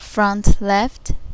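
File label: Front left; hifi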